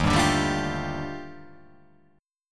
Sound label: D sharp Major Sharp 11th D sharp, chord